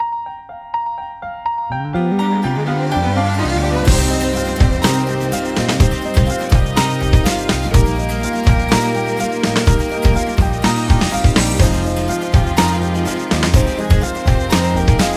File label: Instrumental